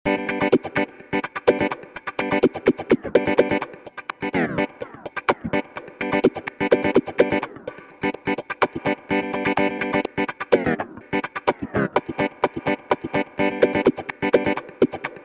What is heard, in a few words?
Electric Guitar Preset Simple Slice